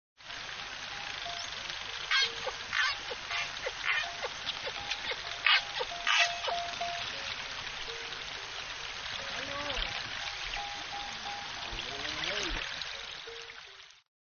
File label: pilotwhales pulse sounds